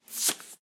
wood tile pickup

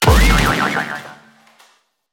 Tank Explosion

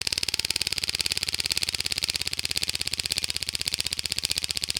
spin

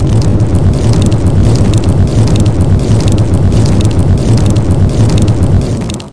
flamethrower

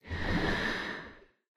underwater breathe air

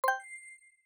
upgrade card select upgrade

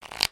passing cards

